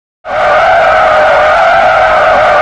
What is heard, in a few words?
skid high, tires; tdmcars